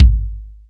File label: reverb kick drum single hit c key